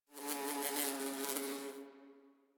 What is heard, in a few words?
Bee; Warframe; Ambience; Nineteen Ninety Nine